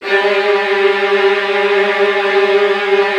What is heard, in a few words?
VN TRILLS, Virtuoso Strings